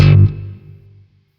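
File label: Shawzin slap G, octave one; Warframe; Sentient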